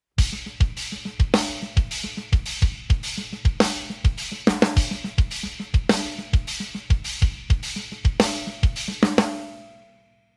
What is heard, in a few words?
MEINL